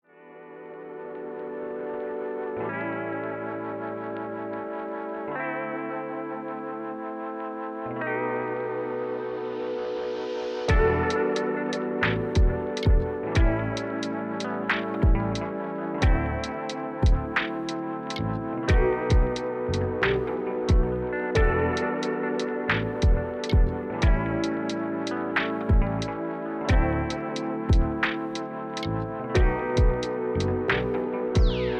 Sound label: Western lo-fi